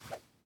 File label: throw